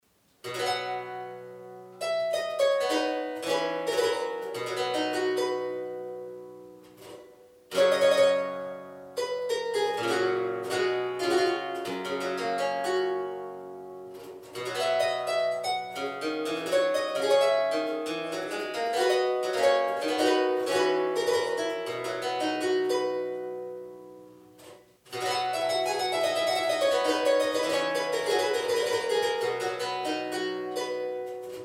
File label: harpsichord